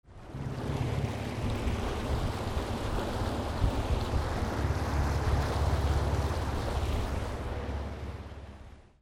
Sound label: BOATS